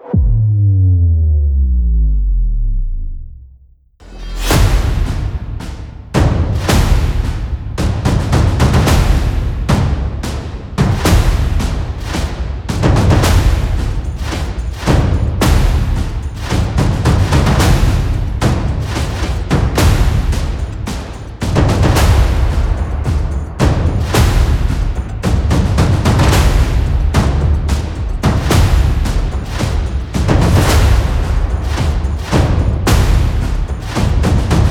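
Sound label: tension cinematic drum fx; By Burak Sound Bank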